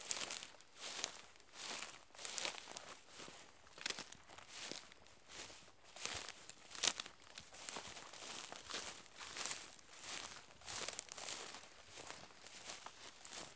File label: footsteps on tall grass